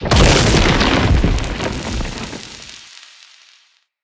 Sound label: Lightning Blast Impact; Characters; Enemy; Abilities; Nimrod